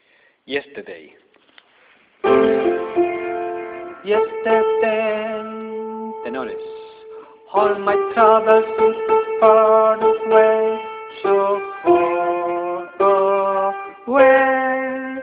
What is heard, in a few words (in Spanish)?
tenores